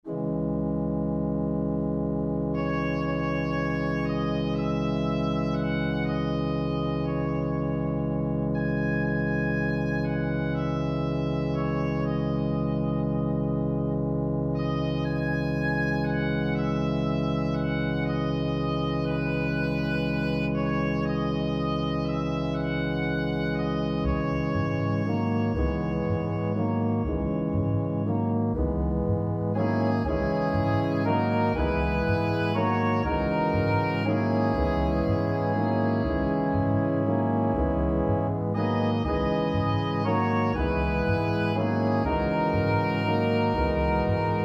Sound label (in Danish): soloinstrument + orgel; Se, hvor nu Jesus træder